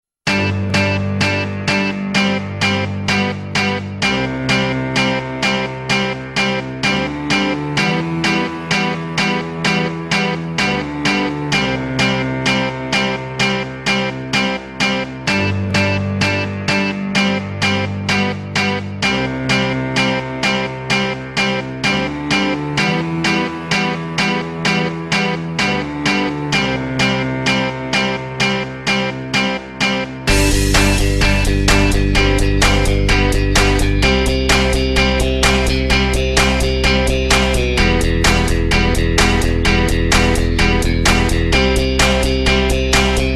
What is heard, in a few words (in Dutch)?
instrumentaal